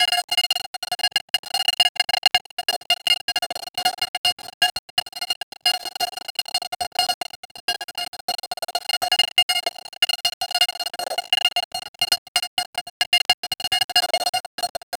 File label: short transient, synthesized sounds